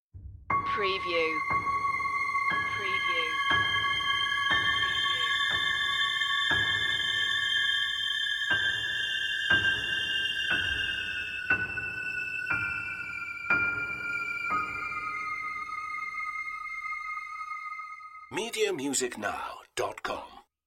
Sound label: sinister halloween twinkle twinkle